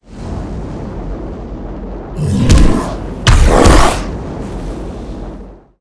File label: boss main, monster